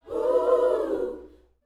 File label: Vocal, Gospel, PHRASE; Spectrasonics